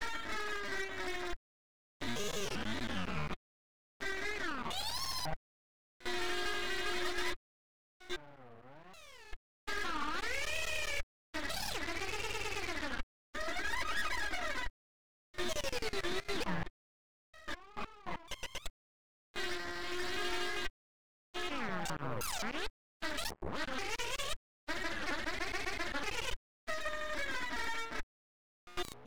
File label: lead